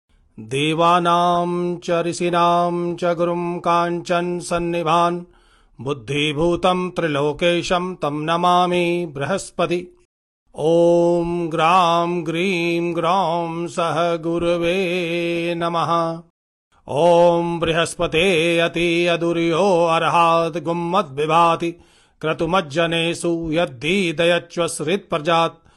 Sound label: couple mantra; mantras